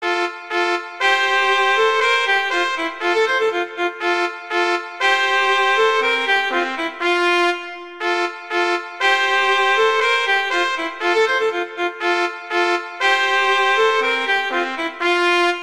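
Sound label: Duet, horn; Mozart; Duets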